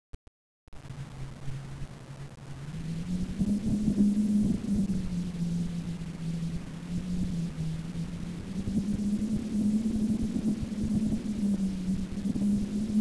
wind

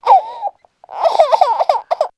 baby; svencoop

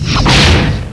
drop

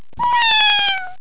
meow; dmc

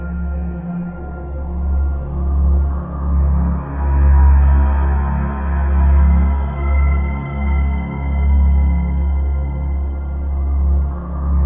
creepy loop, forest ambience; svencoop; damnedforest

